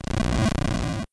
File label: Bowser Fires